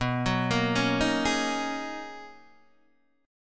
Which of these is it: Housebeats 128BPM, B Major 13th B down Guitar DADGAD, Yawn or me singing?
B Major 13th B down Guitar DADGAD